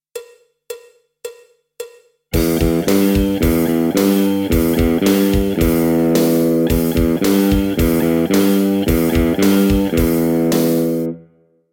Metal-Rock Lick, E-Gitarren

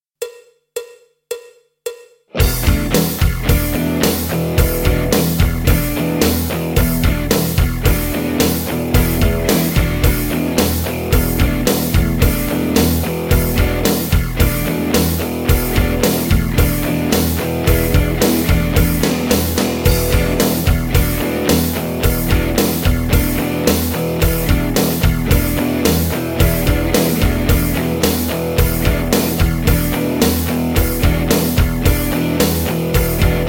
Metal Rock Playback

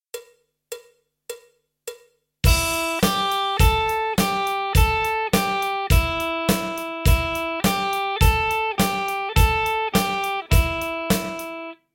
Blues-Rock Lick, E-Gitarren

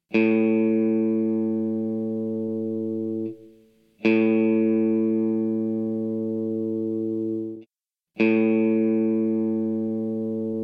Stimmton A

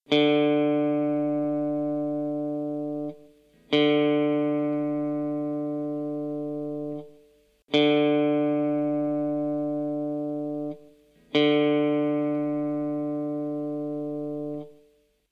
Stimmton d

